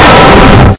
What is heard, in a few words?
windows xp error bassboost